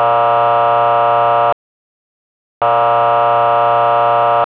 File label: airhorn